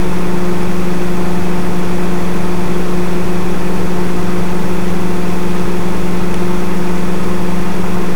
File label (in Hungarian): mikró